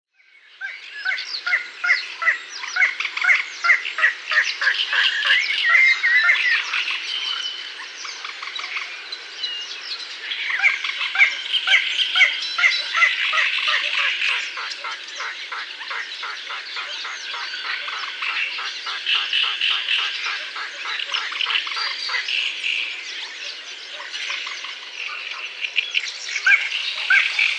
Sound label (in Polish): zielonka